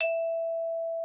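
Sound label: Xylophone E5; Xsample VOL-4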